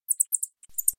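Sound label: squeaky chirping of a bat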